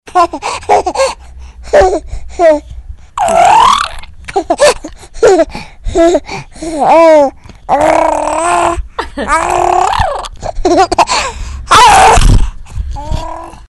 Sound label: baby voice